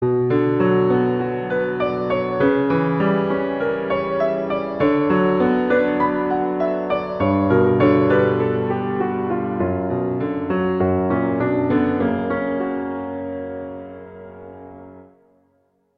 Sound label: Prelude, B minor; Clementi; Preludes